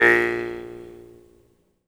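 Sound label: Jaw Harps, Pitched Percussion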